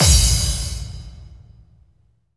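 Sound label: bass drum FX, dance drums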